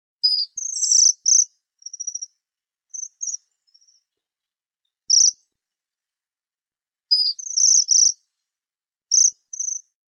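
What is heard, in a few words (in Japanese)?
hirenjaku call, Bohemian waxwing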